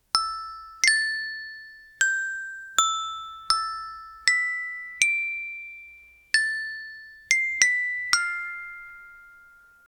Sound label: catchy xylophone playing; kids